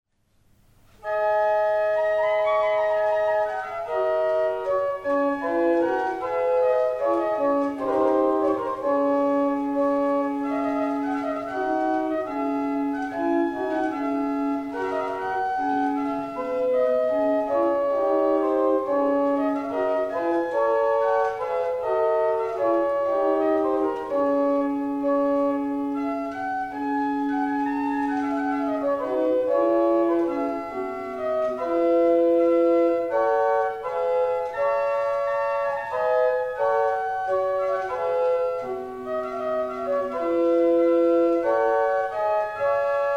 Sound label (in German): orgelbuch; buxheimer